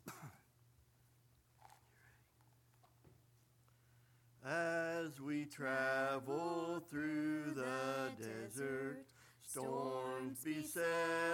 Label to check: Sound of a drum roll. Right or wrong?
Wrong